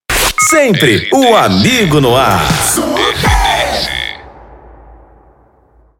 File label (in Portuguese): intercom